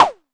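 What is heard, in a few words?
mbrute snare, Arturia MiniBrute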